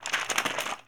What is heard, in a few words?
Book Fly Paper Rustle; SFX Kit; Foley - Misc